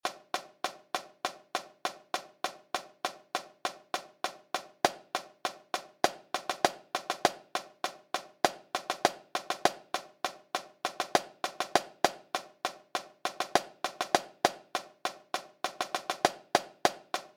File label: snare